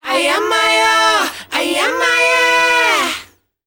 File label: KIDS, S. AFRICAN, World Voices; ILIO; Vocal Planet